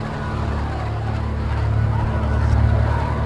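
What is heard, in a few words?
Tank Loop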